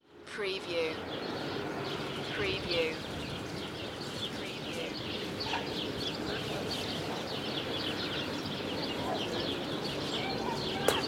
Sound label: Country Ambience Voices; Nature Sounds / Ambiences